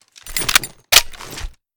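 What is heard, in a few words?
assault rifle raise first, weapons; viper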